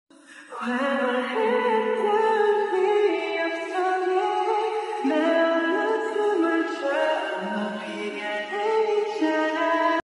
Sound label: acoustic